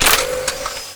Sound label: Gun Weapon Pickup; SFX Kit; Pickups